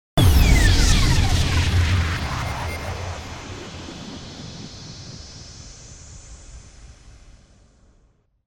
QUASAR BURST IMPACT

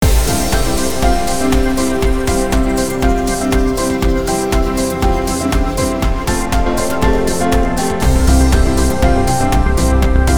Trance Of Inspirational

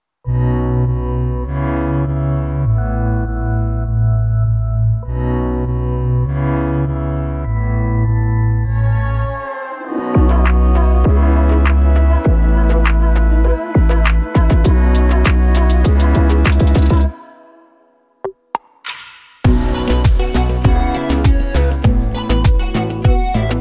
INSTRUMENTAL

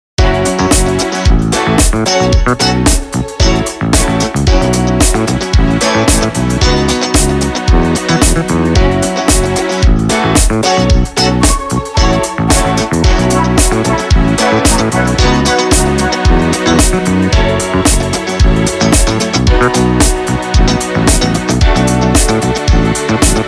disco

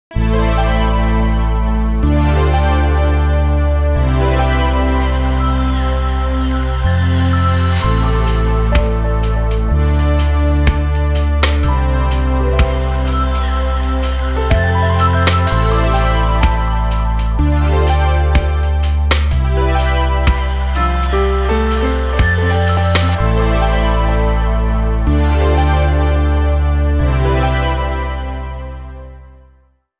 DREAMY BEATS

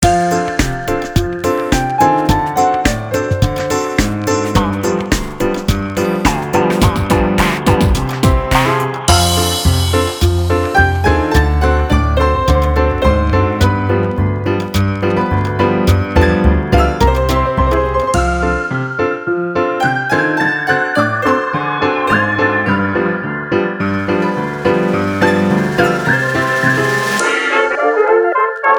WHISTLE SWING

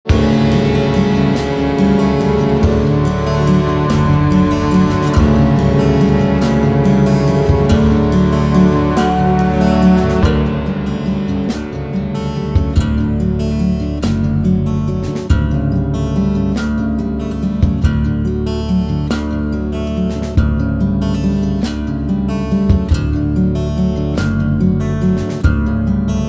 INSTRUMENTAL

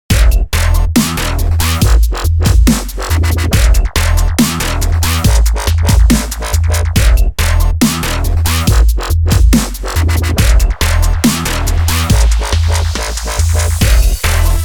REGGAE

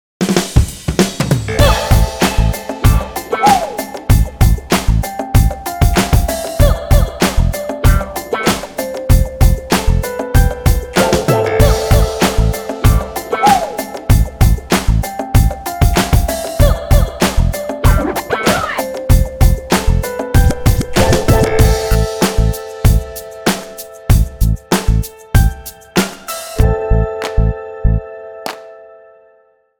instrumental

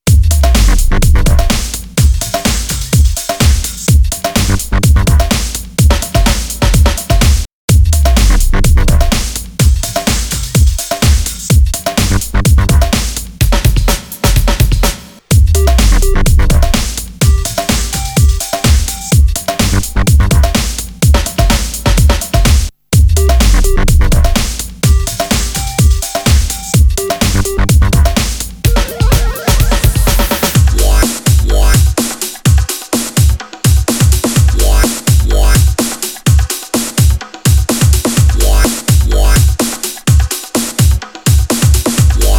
FILTHY BASS BIN

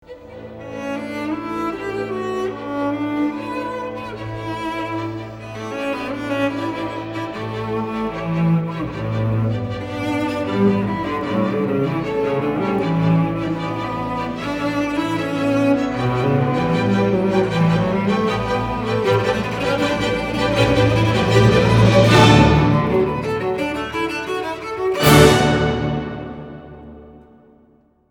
FIRST MOVEMENT

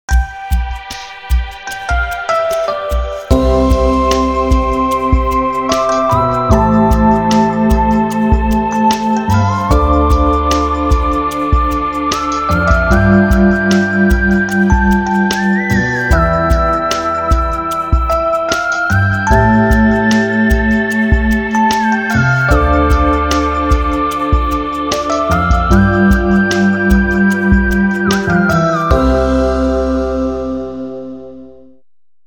RHODES

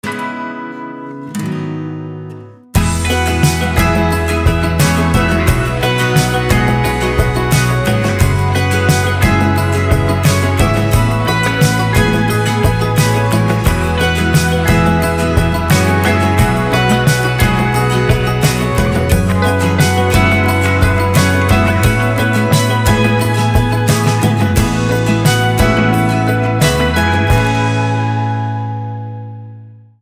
Easy Ramble (Instrumental)

